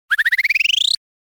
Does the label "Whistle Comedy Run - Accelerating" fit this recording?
Yes